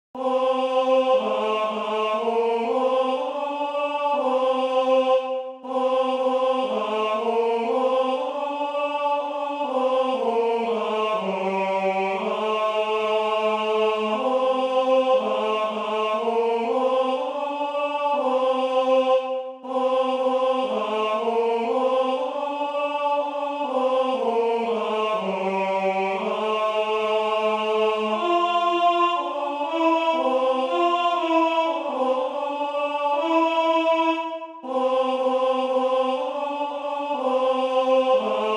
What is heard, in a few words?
Tenor; Orlando di Lasso; O Occhi Manza Mia